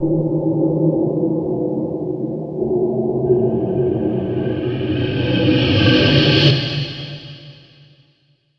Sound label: SPACE-FX